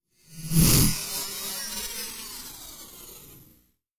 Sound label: Whoosh Sci Fi Space Vehicle Small Fast, Whoosh Space Vehicle Small Fast Spacecraft; Spacecraft Sound Effects; BBFX